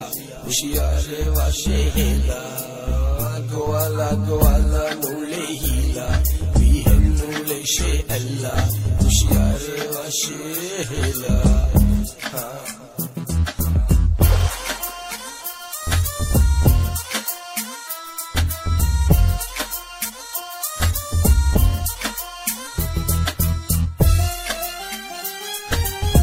song ringtone

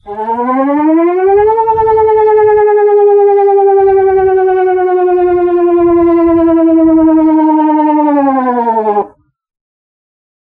danish air raid tone; ringtone

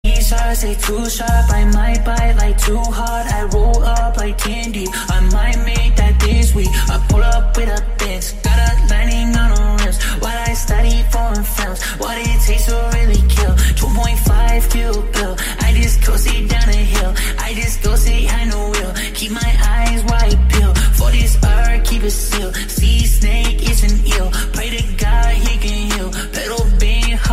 ringtone

